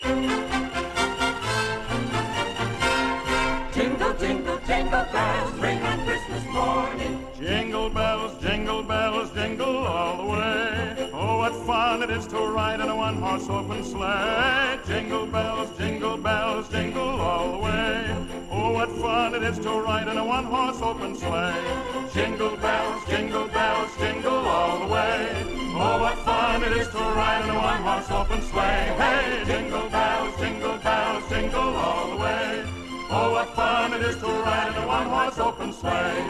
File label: Jingle Bells